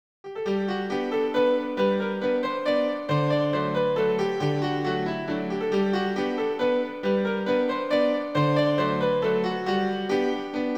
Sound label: waltz piano solo